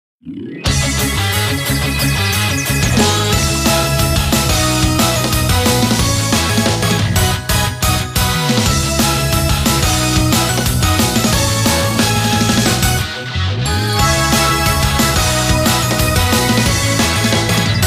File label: Music Success event